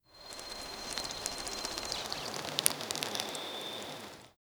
a magical forest ambiance, chimes; SFX Engine

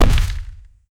short impactful, melee

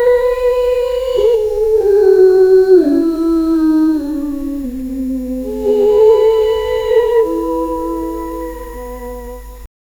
spooky ghosts funny song

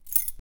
picking up the key

